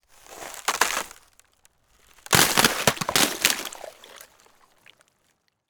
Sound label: ice cracking; nature